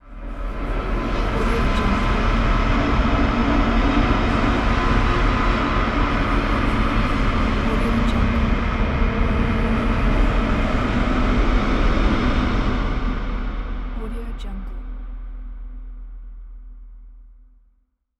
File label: dark ambient horror; umcsound